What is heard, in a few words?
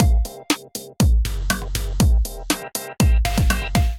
slow trap kit, drums; Ableton; Factory Packs; Build and Drop